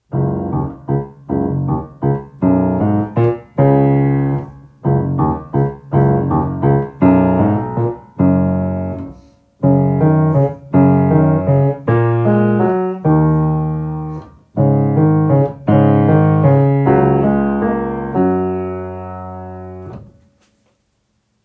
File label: The friendly wallaby, piano